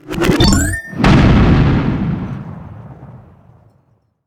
Level Complete; Radiant Crusade VR

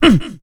Mmh

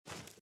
run sand

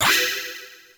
Credit SFX; Tao Taido arcade

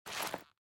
inventory Equip

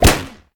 Balloon Pop; City

